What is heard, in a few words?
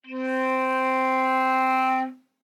Flute nonvib ff C4